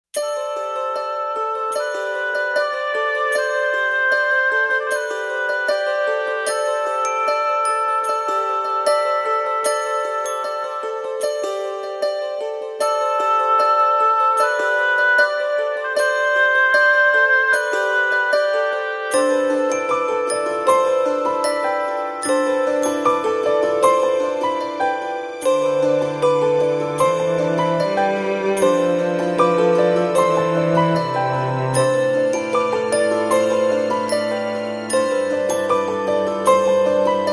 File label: Driving